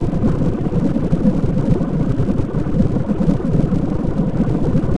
radio; SWH LIFE

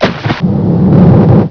Splash Explosion; HyperBattleship!

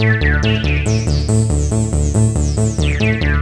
fade in, 140bpm, keyboard; hous-tec

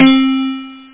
music box; Sounds Terrific II; Weird Science; Amiga

